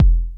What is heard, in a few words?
909 KIK, 12-Bit Drums; Hip Hop; E-MU Formula 4000 Series Vol. 1; Hip Hop Nation